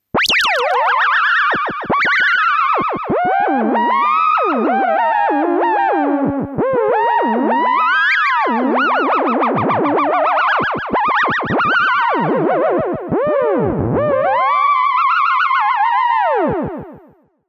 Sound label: theremin echo; theremin audio clip qecho 2